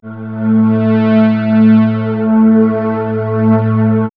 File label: Swirls Threat, pads; X-Static Goldmine